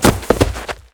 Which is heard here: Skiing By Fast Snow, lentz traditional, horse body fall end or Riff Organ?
horse body fall end